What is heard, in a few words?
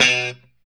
JC Pop B 2, Guitars, JC-Strat Pop